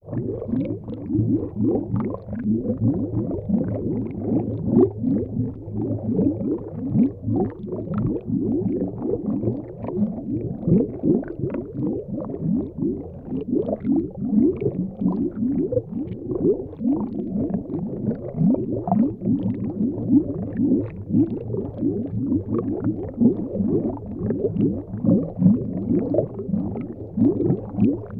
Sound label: Bubble Underwater